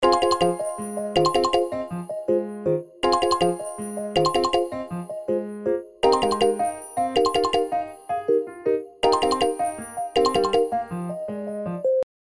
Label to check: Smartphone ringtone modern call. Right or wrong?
Right